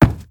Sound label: Soft Wood Run